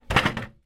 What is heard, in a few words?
household chair wood patio folded lean drop bang; zapsplat